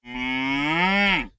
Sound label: cow ack; propack